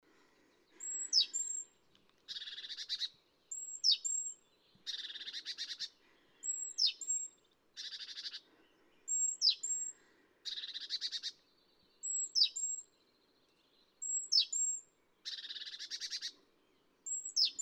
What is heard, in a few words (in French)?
chant alarme alterne